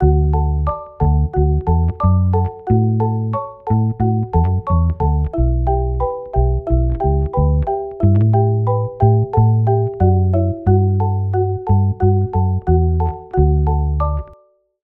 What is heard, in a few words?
Tone